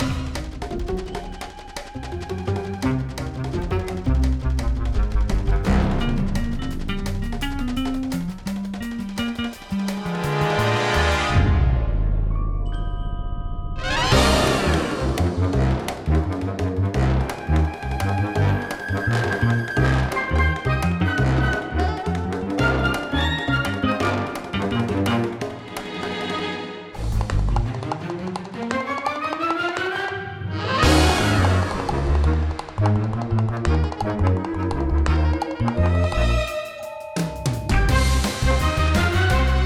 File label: Heli Rampage; Disney Infinity